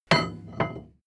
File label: metal tool drop massive; berufe; handwerker; soundpool